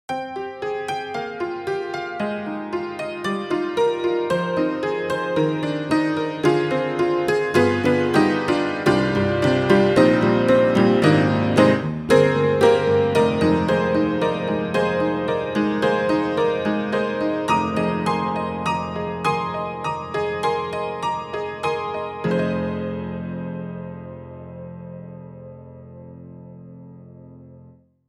Upright Pianos Barroom Upright Barroom 50's Rocker; Synthogy; Ivory II